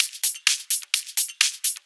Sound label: Hihat Loop 128 BPM